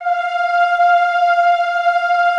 F4, Classical Choir; Best Service; Extended Classical Choir

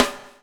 BUBL; Spectrasonics - Backbeat

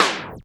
SNR, Tekno Industrial; East West

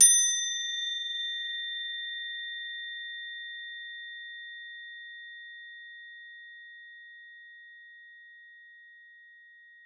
CRO A#4, CROTALES; Xsample VOL-4

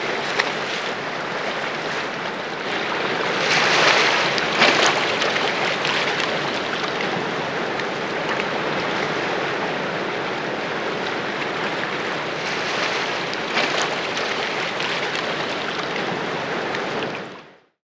sfx, FX Atmos

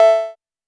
TR808 Cowbell, Roland SH-32 Drumset

